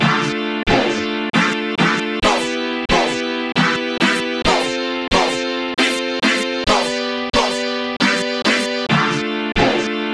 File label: She's Playing Guitar; puffstale soundtrack 2020